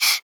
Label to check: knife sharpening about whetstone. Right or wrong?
Wrong